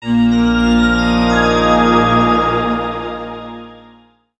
Jingle Dungeon Start; Puzzle & Dragons Gold

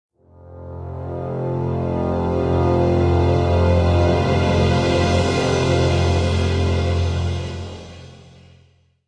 Medieval Foreboding; Civilization Revolution; Xbox

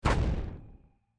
Bomb Destroying; my cute commissar 2020 gamerip

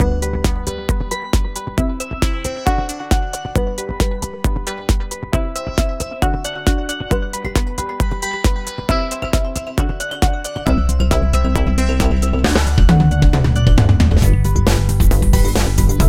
Race Music

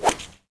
Swing Normal, golf; Pangya; Fantasy Golf

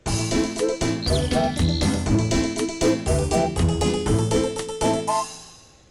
Penguin found

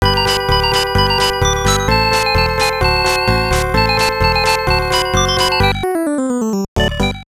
Jingle

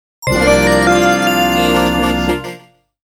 Neo-Classic Arcade Jingle; Sol Cresta

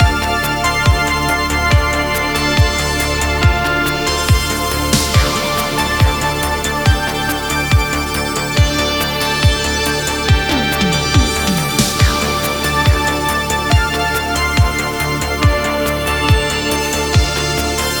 Opening Loop; Galaxy of Pen & Paper

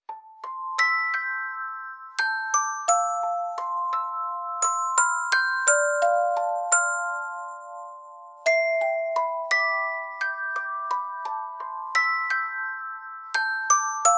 Music Box; Angel Type Legato; Monologue

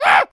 Monkey boss Hit

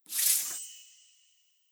favor open

